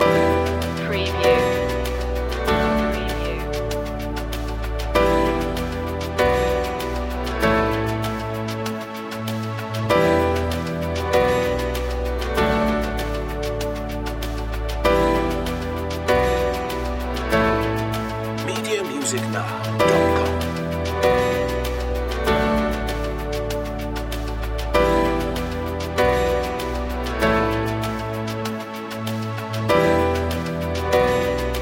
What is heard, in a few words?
petra k loop